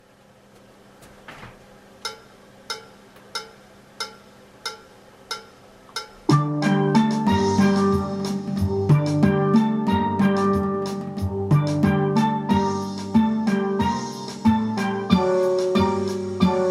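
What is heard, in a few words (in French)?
GUITAR Harmo 3 voix + basse 92, Steel Band; bella-ciao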